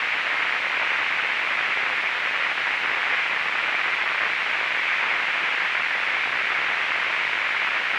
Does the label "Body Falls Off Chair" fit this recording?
No